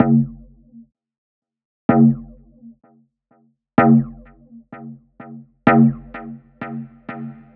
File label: Donks Stabs Seqs